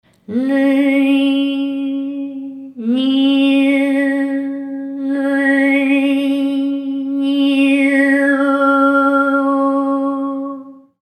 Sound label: girl sings strange